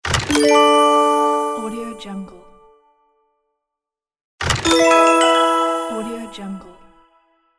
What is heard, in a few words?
open magic chest